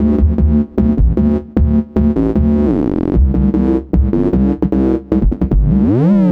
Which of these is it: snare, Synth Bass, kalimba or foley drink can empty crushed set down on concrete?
Synth Bass